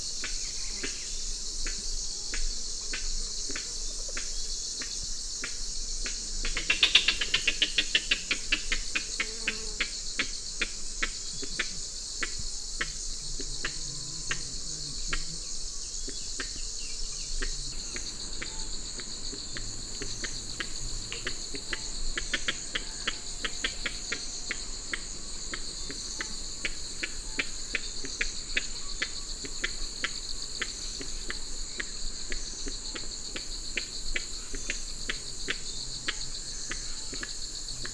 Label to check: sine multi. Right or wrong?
Wrong